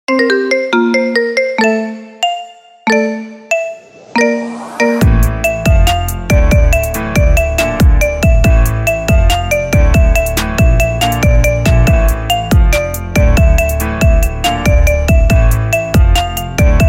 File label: marimba ringtone